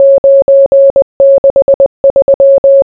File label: morse; qri